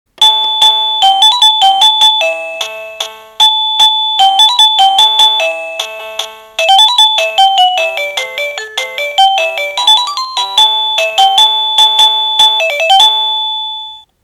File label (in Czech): melodie